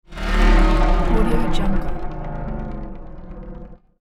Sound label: granular title effect with deep low fade out